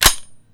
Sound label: gun pickup; pygame tile